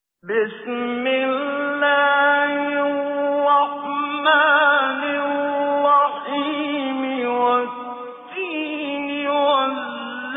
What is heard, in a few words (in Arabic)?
سورة التين, تجويد; المقريء الشيخ محمد صديق المنشاوي